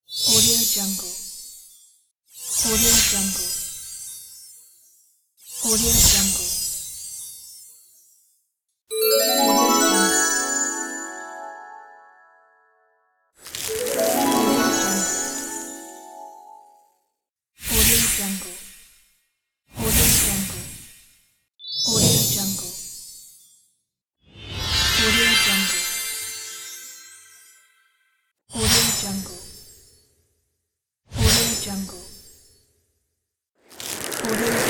christmas transitions

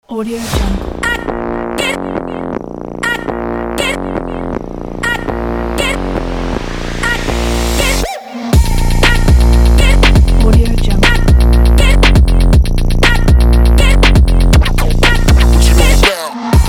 stylish sport bass

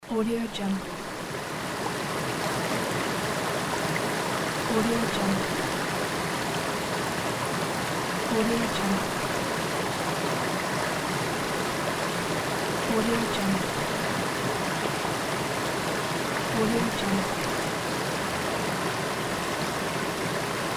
waterfall

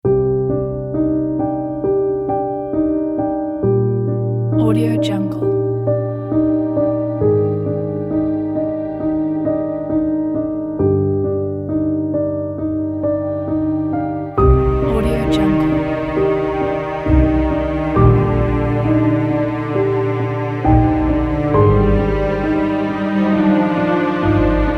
sad sentimental piano; story

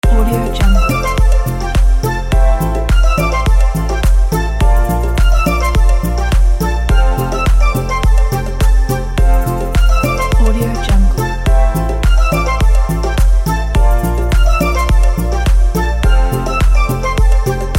tropical loop

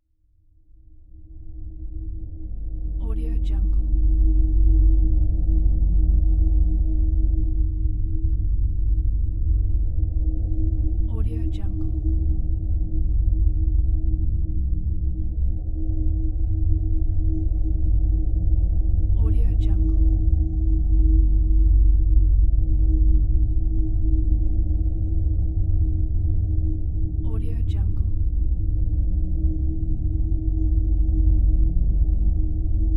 drone ambience

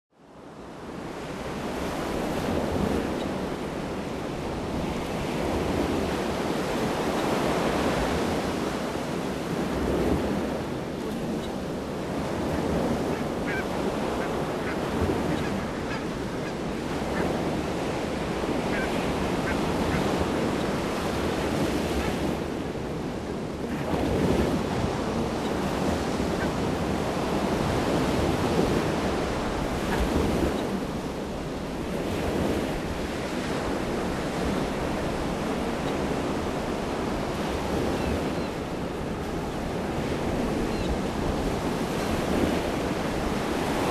stormy sea

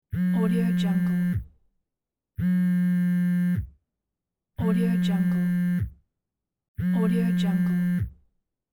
phone vibrate

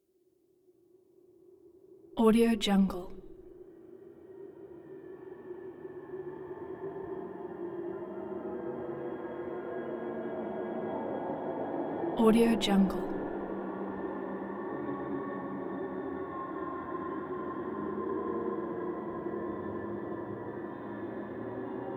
spooky wolf tone eerie hollow moaning howling

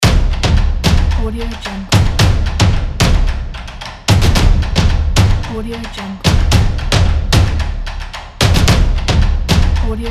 battle drums loop

